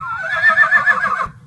horse; Animals

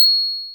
pling, Bells; Misc Percussion

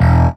growl, Bass; Notes

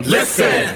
listen crowd; Voice; introductions

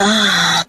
"uh" orgasm; ooh ahh

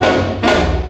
two horns, Stabs

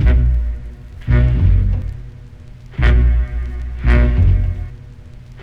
horns, phonky loops

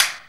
cack, clap n snap, snares

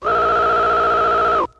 whirr; Zaps and Beeps

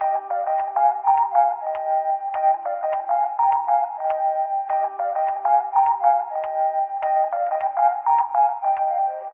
keys soft touches; Loops; Phonky

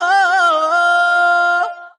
"oh" sung

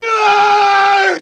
scream

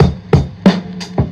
punchy, Boombap Drum loops

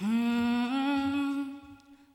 hmmm mmm, Stabs

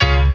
Piano

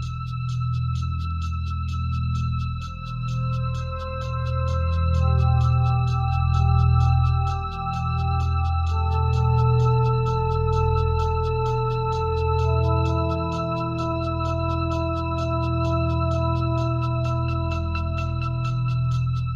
clean sweep; Loops; Electronic

